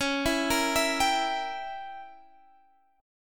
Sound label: Db Diminished 7th Db